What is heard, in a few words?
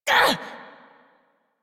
Pain Short, Operator Voice; Warframe; New War